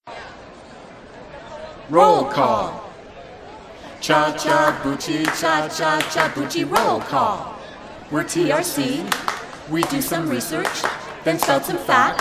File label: cheer